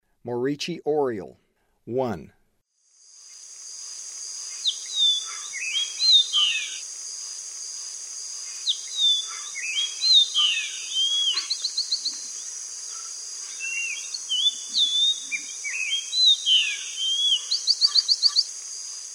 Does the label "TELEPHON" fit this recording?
No